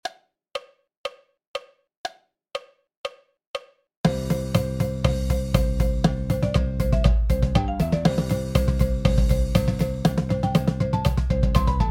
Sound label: 120 BPM Paradiddle Breakdown with countoff; Silver Level; Warmups; Tuscaloosa County High School